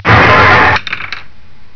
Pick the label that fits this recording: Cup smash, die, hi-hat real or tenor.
die